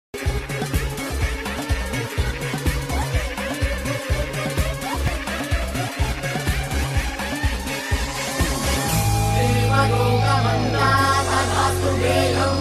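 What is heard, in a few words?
title card background music ringtone; rajaraja chora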